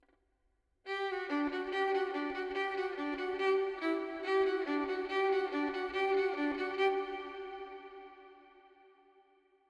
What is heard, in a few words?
violin FX 4-4 GM 70; Melody